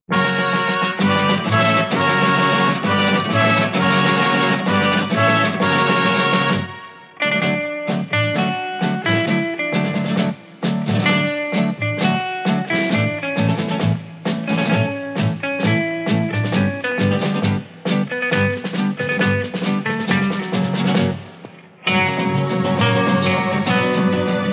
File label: guitar tango